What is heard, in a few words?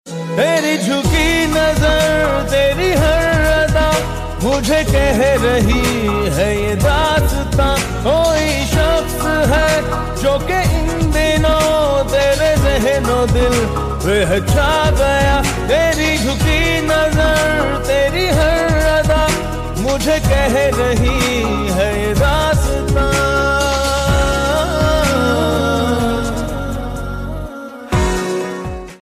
ringtone